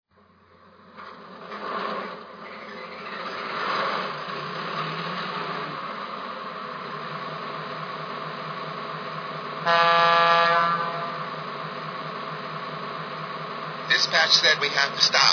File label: trnmstr